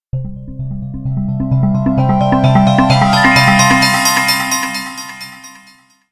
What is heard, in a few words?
slot arpeggio